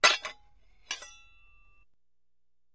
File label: Axe Clanks Scrapes Ax